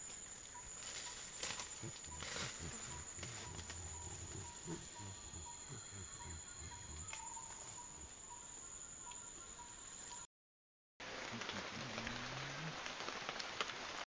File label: Gorillas assembly; wilderness songs